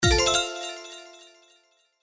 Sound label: Win High; St Paddys Day Blast